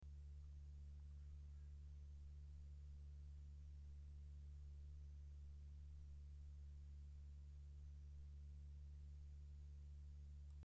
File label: Noctua NF-S12A PWM 5V